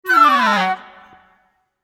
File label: Woodwind run F# min (down); PowerFX; Downtown Orchestra; Runs